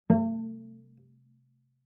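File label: Cello pizz fortissimo sul G A2; MIS Pitches - 2014; Strings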